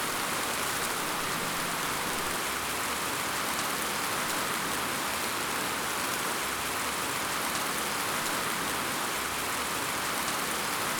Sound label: Continuous Rain; Sample Rain Library; Nature Recordings